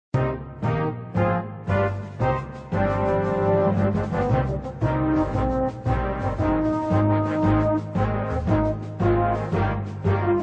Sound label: Battle Music; Betrayal At Krondor